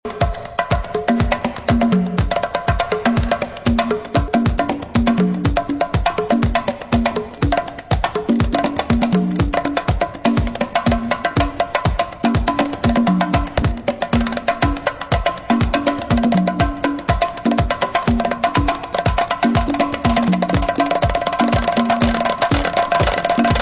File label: stellar funk